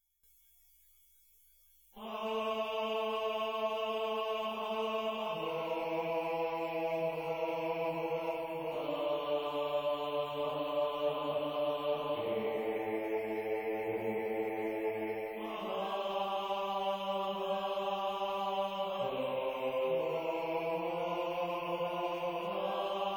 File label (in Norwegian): bass jul sang